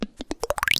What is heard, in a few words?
Pitched teeth, cartoon Foley Fx; musicradar; cartoon caper